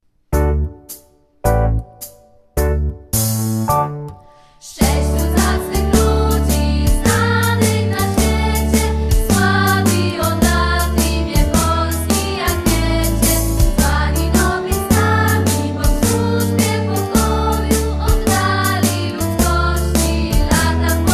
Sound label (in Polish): Hymn wokal